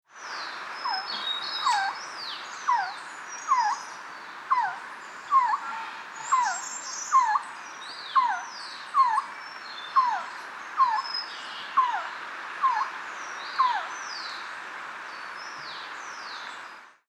Resplendent quetzal

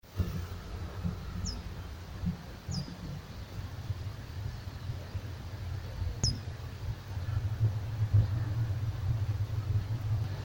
Blue tufted starthroat; پرنده اخترگلوی جقهآبی

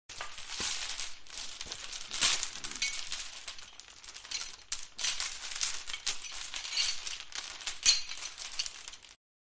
Walking with chain

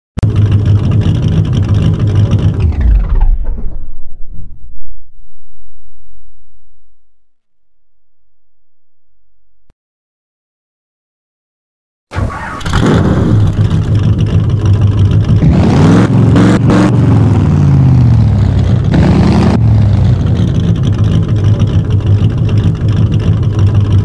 Chevrolet

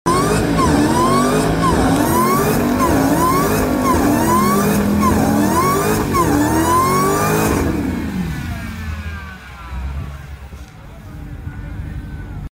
Dodge Challenger

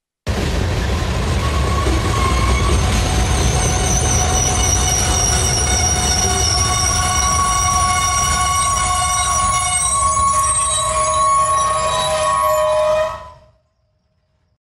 train brake; ترمز قطار

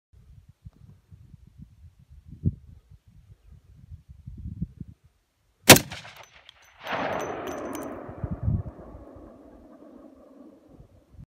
Desert Eagle; اسلحه دزرت ایگل

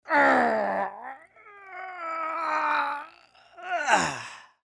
male grunt strain

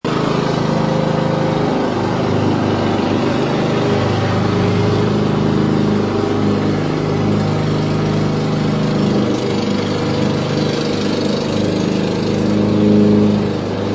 lawn mower; ماشین چمن زن

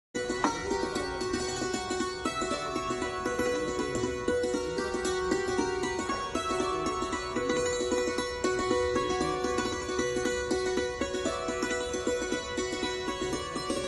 Shahtoor

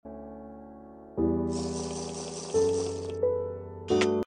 Drinking water; Minecraft

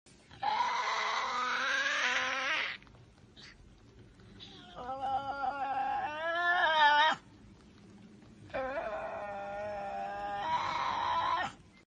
tasmanian devil; شیطان تاسمانی

